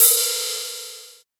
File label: trebly open hat sound d sharp key